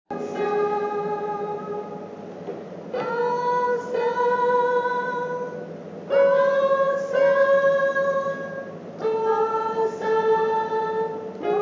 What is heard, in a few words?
Soprano